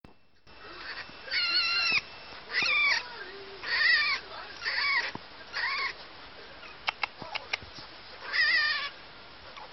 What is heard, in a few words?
scream